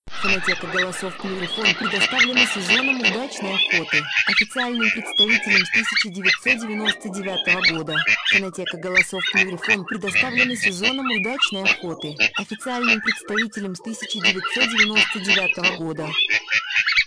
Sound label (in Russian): Утиный базар; plurifon